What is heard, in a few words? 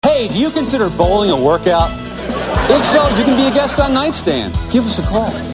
Bowling; NightStand; UpcommingShows